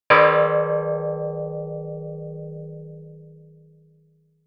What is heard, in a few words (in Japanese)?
除夜の鐘の音